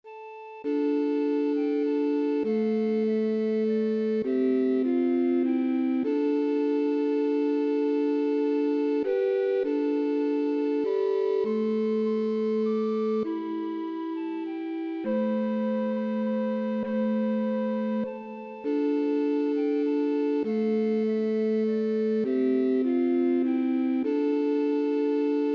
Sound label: PIANO